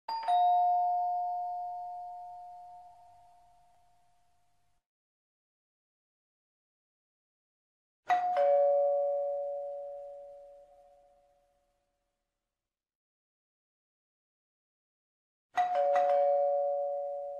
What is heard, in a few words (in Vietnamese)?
cac tieng chuong cua; tieng bam chuong cua